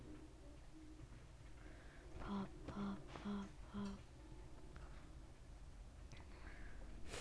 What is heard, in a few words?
pop